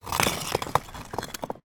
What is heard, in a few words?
foley broken bricks movement